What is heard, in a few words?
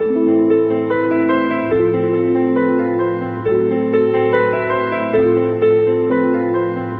piano, Loops Sad